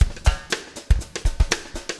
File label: breakout 120, 120bpm, loops